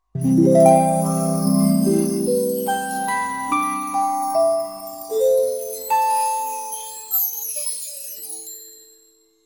magic

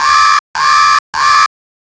sci-fi alarm futuristic

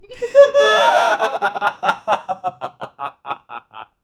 two men laughing comedy; amused

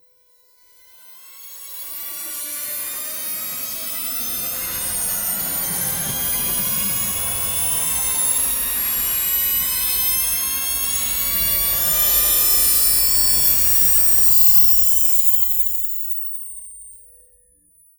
charging magic divine

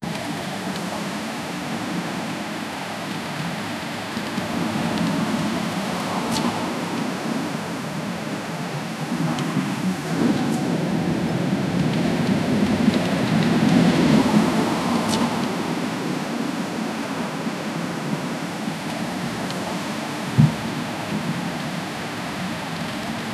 crashing waves thunder, storm

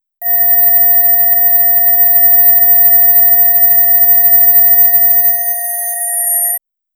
file loading sound with, digital

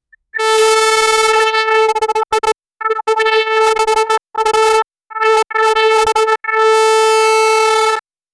a person, sticks

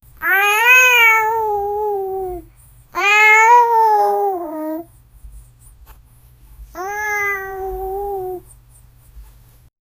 a baby kitten meowing; childlike

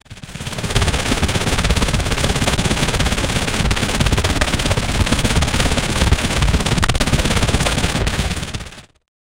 sci-fi army of military

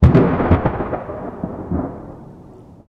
quick thunder claps in an

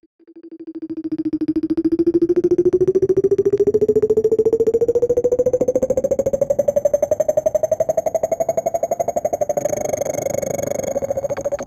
short high pit, attention grabbing

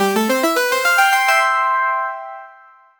a triumphant 2 second fan, bells

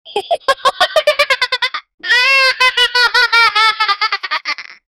child laughing

